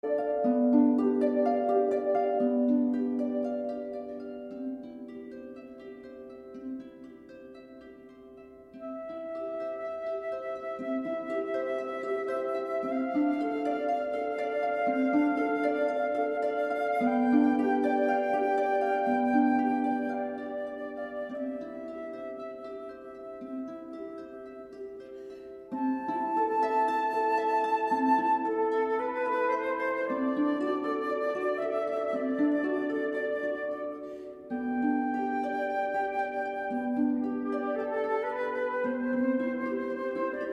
Ave Maria, Version For Flute and Harp

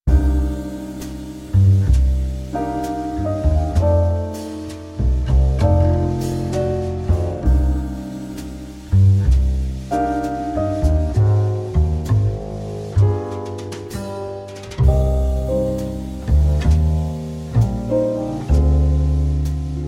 Smooth Jazz Music

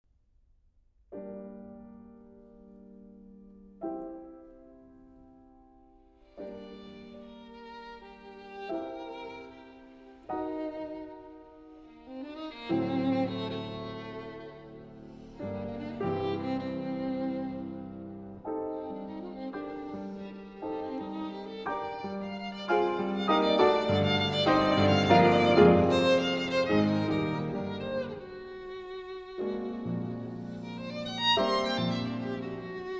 Violon Sonata in G Minor, Allegro Vivo

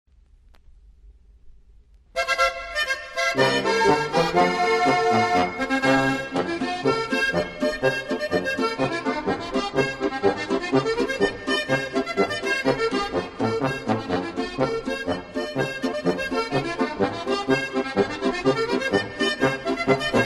Polka

